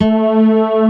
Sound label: atmosphr; cubic